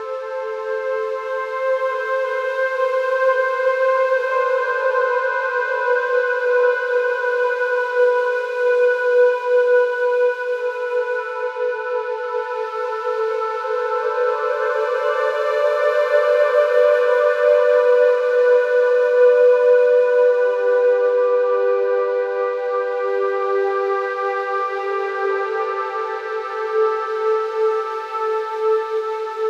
flute simpleton

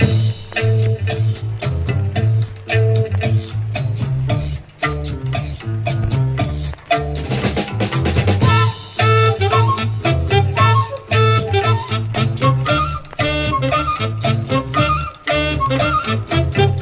horns, loops, phonky

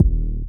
brake, Kicks Heartbeats

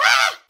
scream

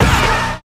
bwamp, Stabs

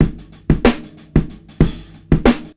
offbeat, Funky Drum loops

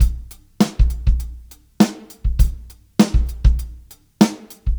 zither, drum loops, boombap

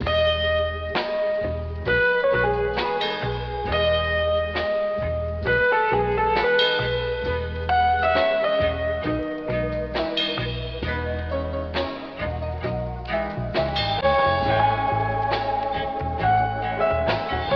piano keys drums, Loops; Phonky

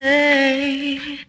ay SUNG

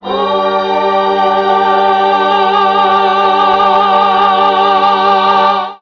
gospel choir; Notes

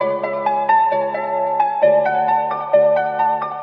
piano, Loops, Sad

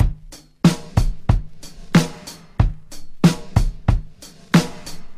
filled in break, Boombap drum loops

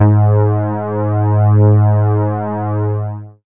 bees, Synths; Notes

